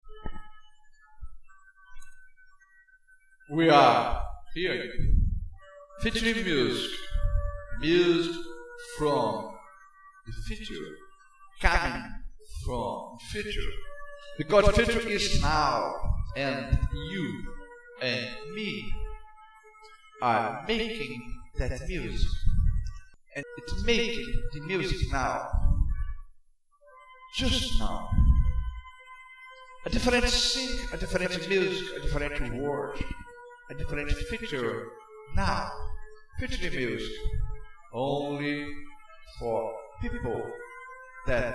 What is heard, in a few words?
future music no noise